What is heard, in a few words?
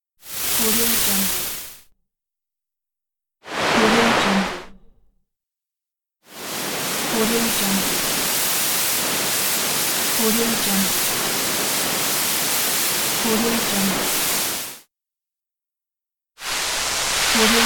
skiing; efxideas